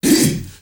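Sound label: MALE "HEHE", Heart of Africa, shout; Spectrasonics; Heart of Africa 1; CH. SHOUT2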